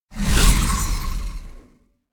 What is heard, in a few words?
elec dragon roar weak; anemoi